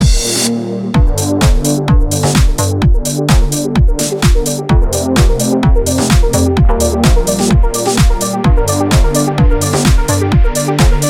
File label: Instrumental Remix